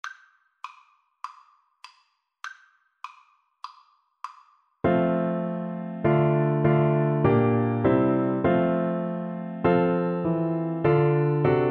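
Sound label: fairest lord jesus, alto saxophone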